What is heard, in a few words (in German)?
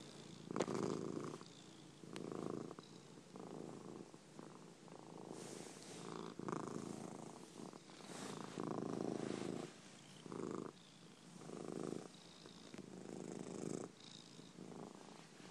zufriedener kater